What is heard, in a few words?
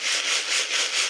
sieve looped